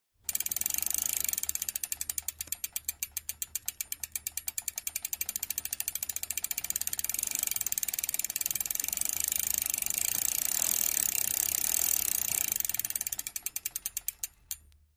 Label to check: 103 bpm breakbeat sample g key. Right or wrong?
Wrong